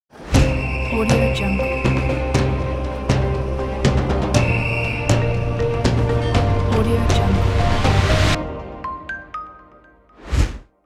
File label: cinematic sport logo; snowflakeaudio